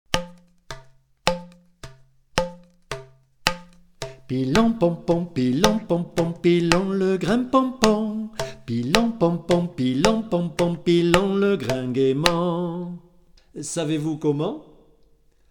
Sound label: Pilon pon pon Togo